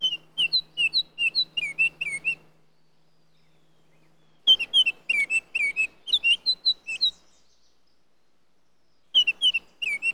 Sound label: Oriole, Bird Calls